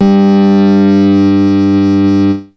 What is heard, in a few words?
clean, synths dancerap; The Sample Bank